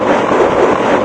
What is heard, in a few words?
ball roll; There; ThereClient; thereball